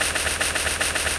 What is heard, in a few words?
spider walk; Seer; GamigoNA